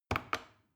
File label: plastic button small click heavy slow